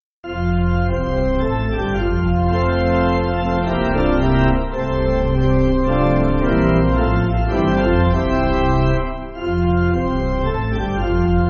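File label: Pipe, Pipe Organ, Music Backing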